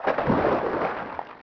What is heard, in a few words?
player Spawn; Feeding Frenzy